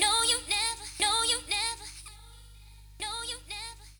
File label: groove vocal